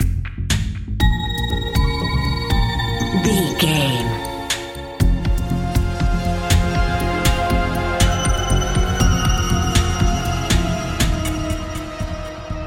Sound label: mysterious video game expedition